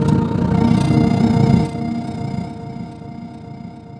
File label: Stereo Power Up; FREE SFX PACK VOL 3